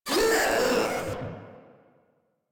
Pain; Proto Infested; Warframe; Infested Ninety Nine

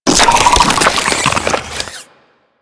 Infested Weakpoint Spawn; Warframe

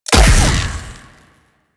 Rifle Shot; Warframe; Weapons; Wisp Rifle

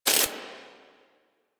Screen Glitch

